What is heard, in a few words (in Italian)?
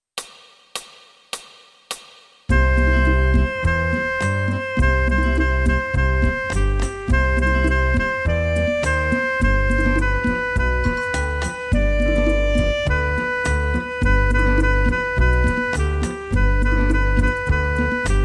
Tastiere